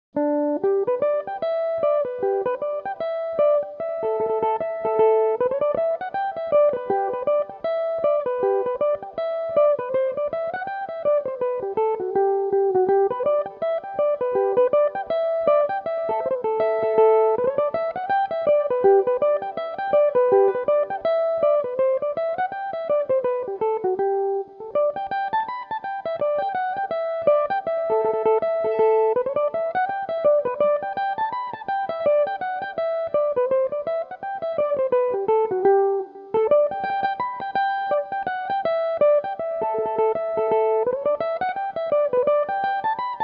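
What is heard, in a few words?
reel G major, Irish mandolin; The Green Gates; Belmuse EM-190 electric mandolin; Recorded for the Mandolin Cafe's Song-A-Week Social Group; Reel (4/4)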